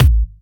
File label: Hard Kicks; Vengeance Samples